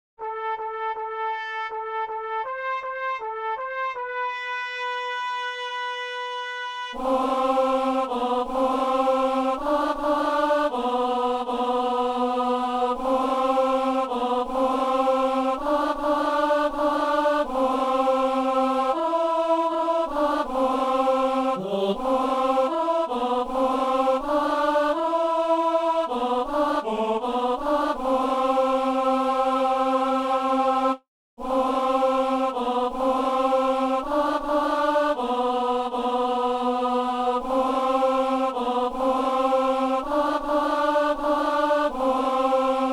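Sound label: tenor; Messe de Saint Paul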